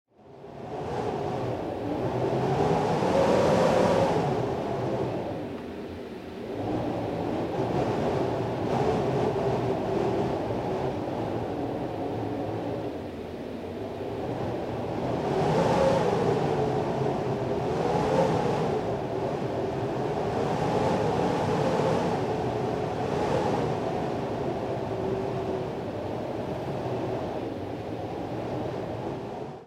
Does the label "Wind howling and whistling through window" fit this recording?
Yes